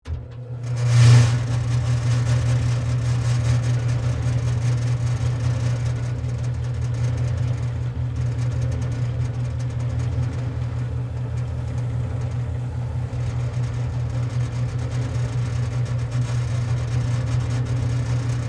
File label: Table Sander Wood, Industry, Table, Sander, Sand, 1X4, Wood, On, Run, Off, Short; BlastWave